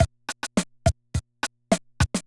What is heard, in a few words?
Loop, classic machines, rpm 40